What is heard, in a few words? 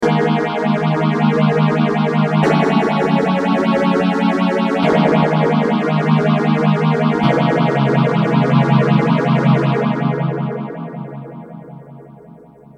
Mutron phasor